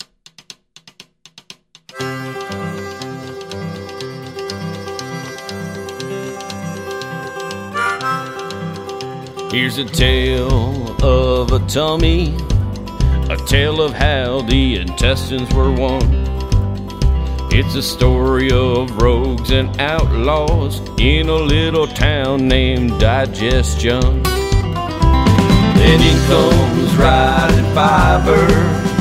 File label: ballad; fiber